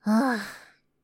ugh

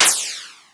Machine, Retro Games Sound FX

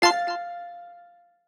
Dot Ball, User Interface; Advanced UI

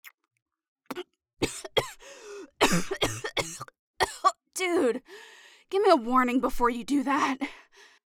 suck

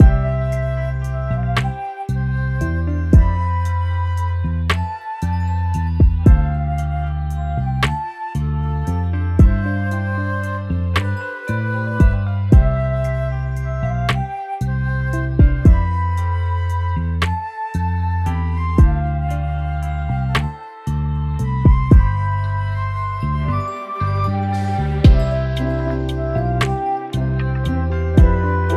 Kawaii Love Lofi Music Loop; Kawaii Love Lofi Music Pack 2

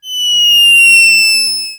Fall; RetroGamesSoundFX

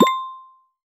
Choose Dragon, User Interface Menu; Advanced UI

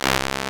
Hum; Retro Games Sound FX

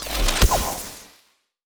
Execution

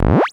Move; RetroGamesSoundFX

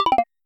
Lose

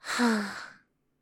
ugh

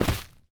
Land, Combat

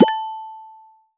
Choose Background, User Interface Menu; Advanced UI

